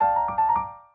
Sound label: swing up start piano blues; LG Wing 5G Verizon